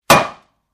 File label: Beer Bottle Break Gla, Beer Bottle Break; Single, Heavy Glass Bottle Bre; bottles